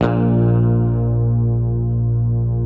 Bass, HAMER12 SUS

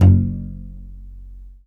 C. Bass Balalaika, Plucked Strings

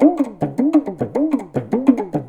PAPANG, Indian Traditions; USB Soundscan vol.45; AKAI

